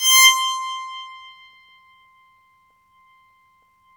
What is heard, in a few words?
BOWED PSA, Bowed Psaltry